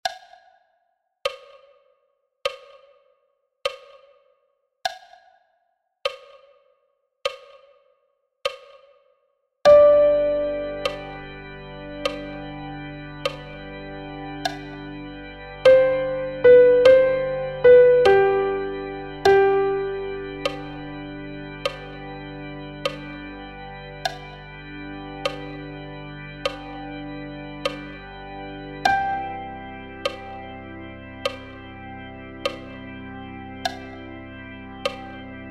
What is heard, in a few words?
Cross Harp Blues